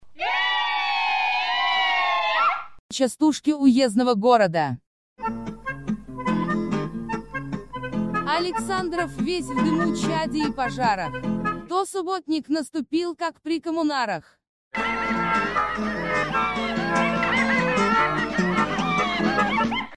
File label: sub